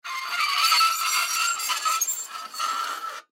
Metal Scrape, Metal Scrape Rusty Surface Slide Squeak; Scrapes; Foley; Objects; Blastwave